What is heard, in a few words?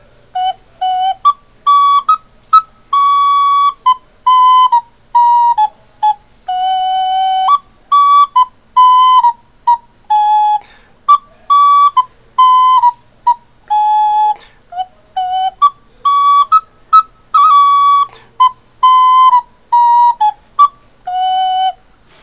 Twinkle